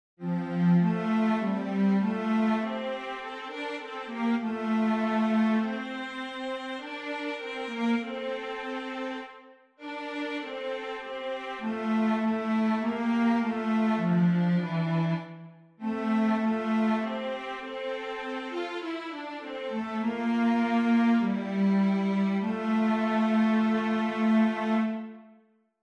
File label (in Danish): Tenor; Et barn er født